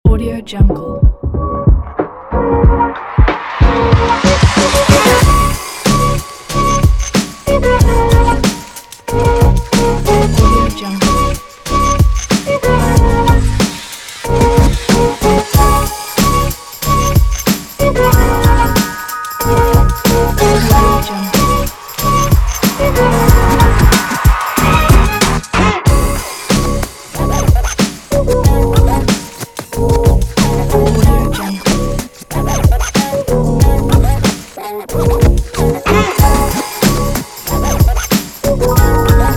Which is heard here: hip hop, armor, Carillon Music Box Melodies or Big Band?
hip hop